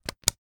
household torch flashlight maglite switch on or off; zapsplat